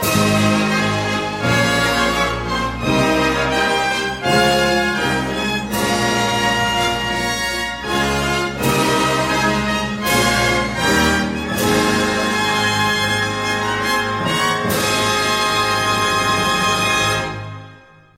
Fanfare

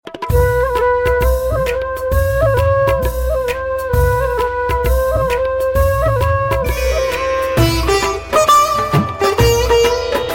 Classical

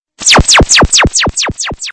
Lazer

